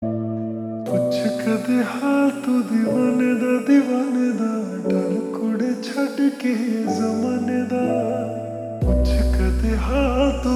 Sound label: Ringtone Slowed Reverb